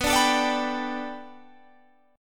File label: B Minor 7th Sharp 5th B Guitar Open G, chord